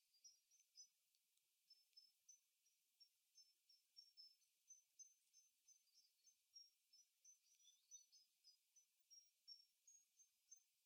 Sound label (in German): Lichtung Soundaufnahme Botanischer Garten Marburg